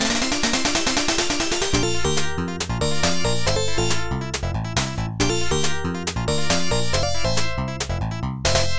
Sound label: Round Clear; DJ Boy; Genesis